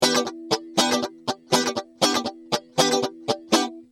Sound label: rhy gtr dod 690